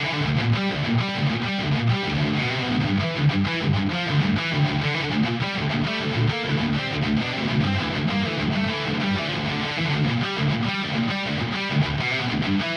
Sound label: Neural DSP Quad Cortex EV101III